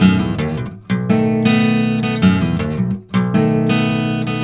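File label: baritone riff, Blues Guitar; ILIO; Fretworks; 108 BARI RIFF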